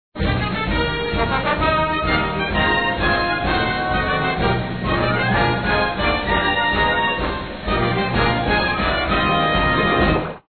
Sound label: win Music; SpongeBob SquarePants Invasion of the Patty Snatchers